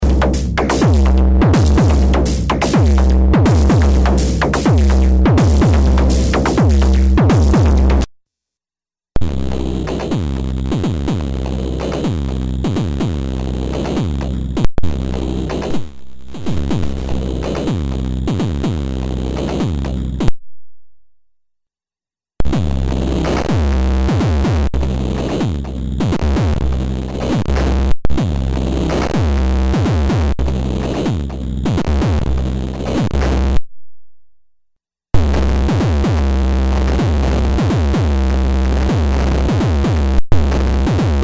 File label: drums; AMNESIO